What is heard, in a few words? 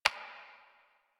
Computer Keyboard Stroke